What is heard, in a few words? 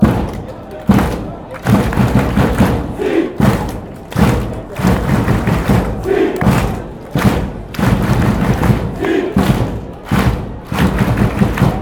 victory fan chants